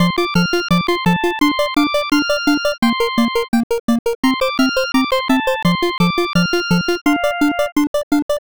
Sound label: bgm